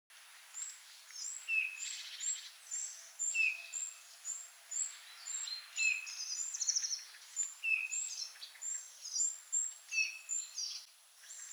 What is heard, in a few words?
Pyrrhula pyrrhula; SETE